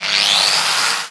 BEATTACK

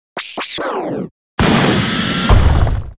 emoticon

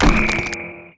MUSIC STONE

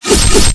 ATTACK

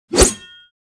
ATTACK JUMP

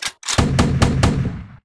ATTACK

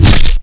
BEATTACK

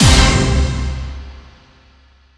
club start

